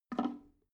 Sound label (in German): drain valve open soft, Badezimmer; haus garten